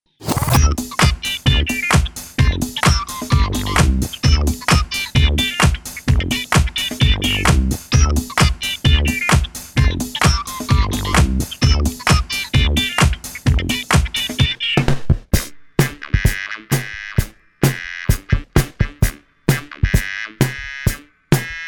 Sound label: bed funky n fun